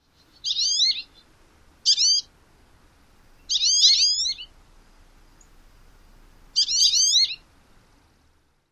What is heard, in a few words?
nasal call variant